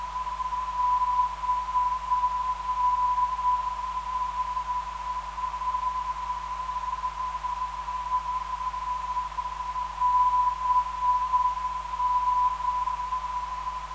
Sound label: 345kHz, ndb